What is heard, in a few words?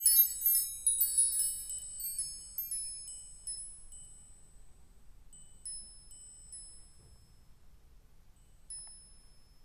wind chimes